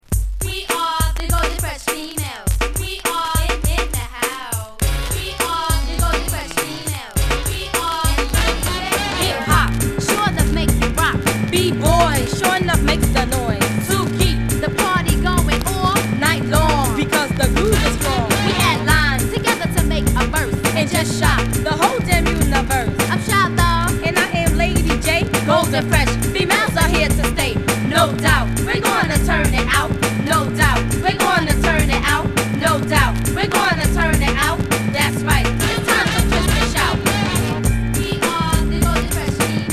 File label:  (vocal); Sam Records